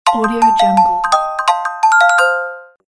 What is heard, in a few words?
bell ringtone; bell ringtone 5